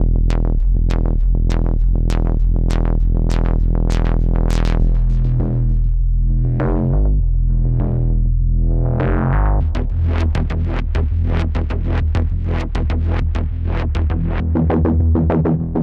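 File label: bass and sequences; serum particles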